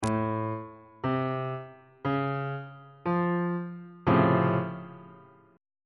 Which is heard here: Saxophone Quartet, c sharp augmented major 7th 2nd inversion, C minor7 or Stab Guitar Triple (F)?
c sharp augmented major 7th 2nd inversion